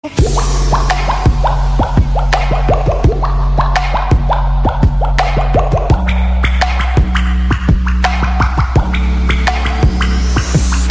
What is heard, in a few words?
bubbles